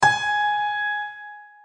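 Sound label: G#5 piano; notes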